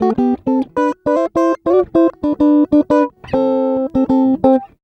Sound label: Acoustic Guitar 99 bpm G, African Guitars; Quazibeat; African Secret